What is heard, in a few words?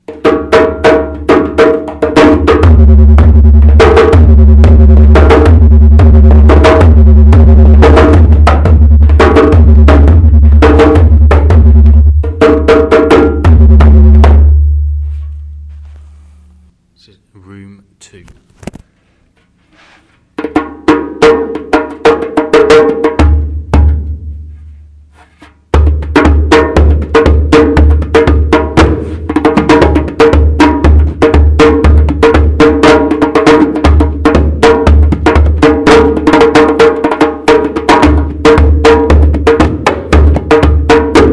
djembe in the catacombs